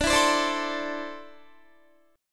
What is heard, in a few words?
D 7th Suspended 2nd down Guitar; D7sus2; strummed